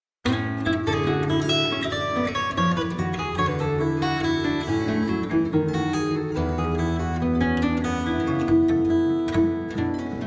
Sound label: Ballad